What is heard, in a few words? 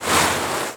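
movement loop; Dolphin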